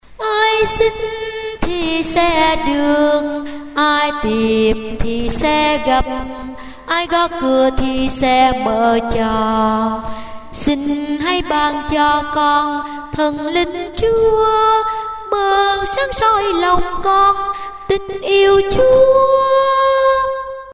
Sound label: tenor basso